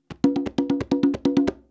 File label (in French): roulement ternaire, congas